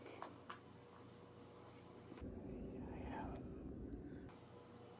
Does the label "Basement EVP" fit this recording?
Yes